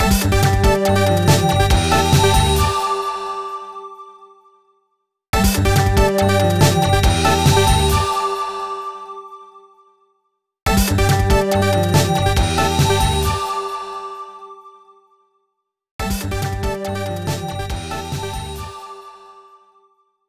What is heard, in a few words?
Microgame Success; Gremlins Gizmo; wii